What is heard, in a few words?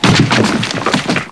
ROCK; PC Gamer IT CD 32; mtm2trial